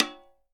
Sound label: CAN HIT; 1000fx